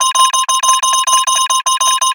Gear many many call

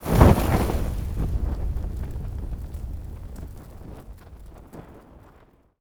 Arrow Launch Fire; Timelock VR

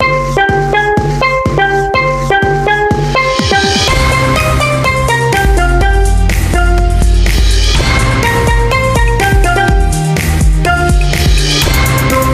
Instrumental; Rhythm Heaven Fever Repainted

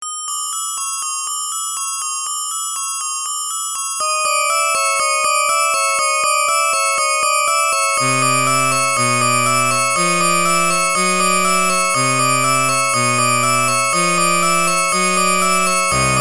Time Out Warning; Tiger Road; arcade

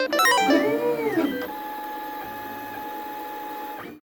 Printer Turn On

